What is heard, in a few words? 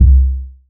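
CLUB bass drum, CLUB KIT; Wizoo - Platinum 24 Electronic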